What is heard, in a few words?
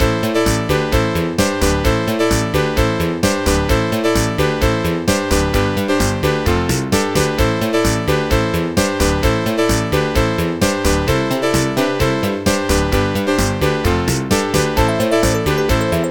Loop; Spacejacked